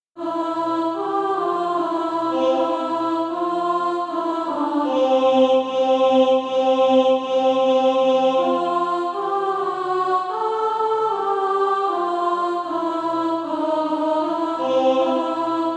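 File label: alto; SATB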